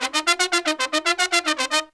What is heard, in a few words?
Pop & Funk Brass, L; Best Service ProSamples vol.25; AKAI